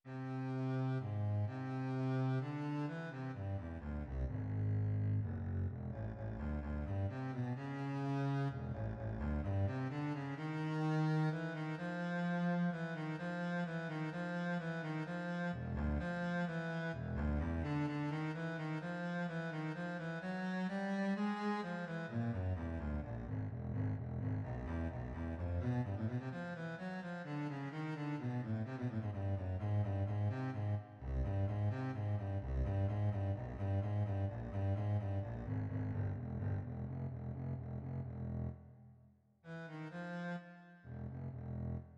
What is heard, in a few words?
suite for contrabass allegro